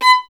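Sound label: STR violin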